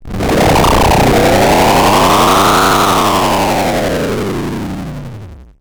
Sci-Fi Electronic Energy Distortion; Science Fiction

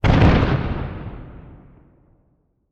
Explosion Dynamite; Fire and Explosions

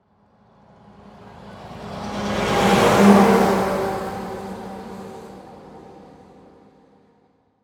Truck Garbage Truck Drive By; transportation

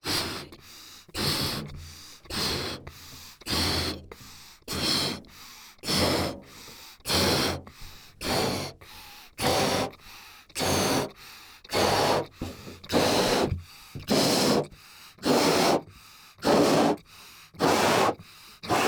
Cartoon Balloon Blow Up Whole Balloon

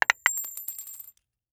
Impact Coins Multiple Coins Drop On Board

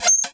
Beep Evolver

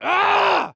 Horror Scary Human Male Scream Short Scream

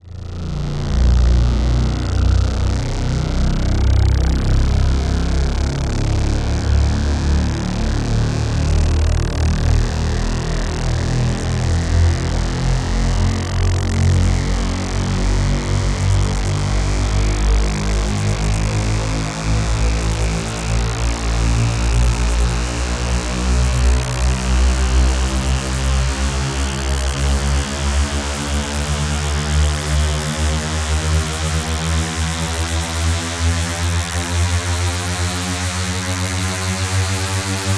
Ambience Turbine Speed Up; AdobeCC

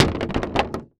Impact Wood Drop On Hollow Wood Floor

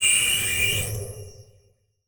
Transition Whoosh Fly By; Production Element

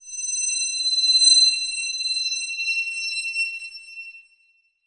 Title Transition Crystal Glow; Production Element Title Transition

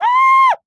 Animal Mammal Carnivore Elephant Seal Cub Call; animals; AdobeCC

